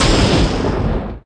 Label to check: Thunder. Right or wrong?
Right